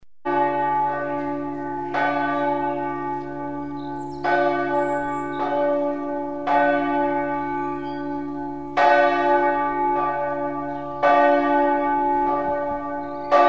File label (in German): Magnusglocke; St Magni BS